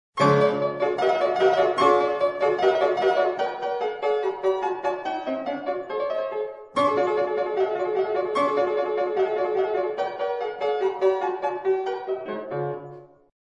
Соната Ре Мажор 1ч. главная тема

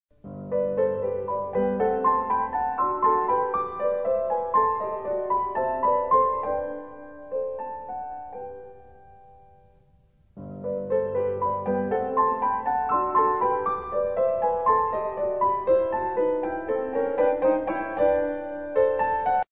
Соната ми минор 1 ч. побочная тема

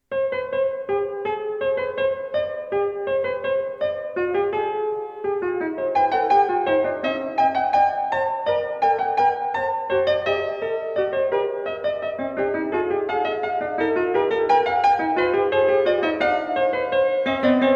Бах — Фуга до минор из 1 тома ХТК

